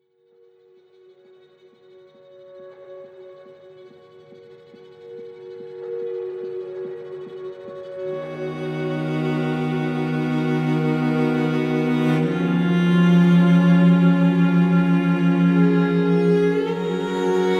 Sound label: Salsa Music